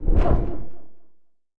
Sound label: Attack; pixelheros